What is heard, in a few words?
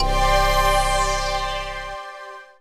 Jingle Battle; Iron Feather